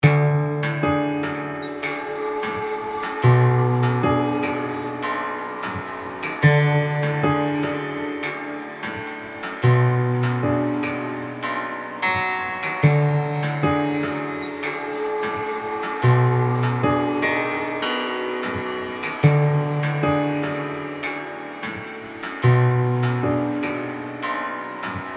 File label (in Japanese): 噂話, 怖い; 都市伝説やホラー系に合いそうなBGM; アンビエント